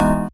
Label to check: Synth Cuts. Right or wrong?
Right